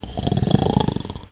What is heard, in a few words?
human snore in; theodyssey